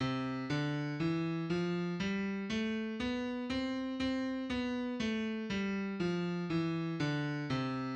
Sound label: piano do re mi